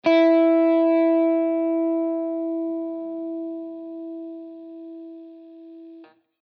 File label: E 1st String, guitar